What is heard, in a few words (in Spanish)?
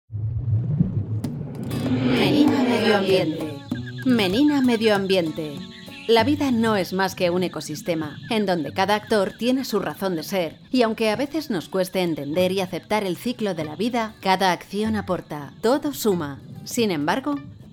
MEDIO AMBIENTE; SALA 2 MENINA